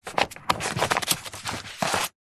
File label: office pile of plastic folders document wallets movements